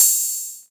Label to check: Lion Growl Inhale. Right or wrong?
Wrong